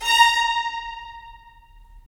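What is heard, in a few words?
strings violins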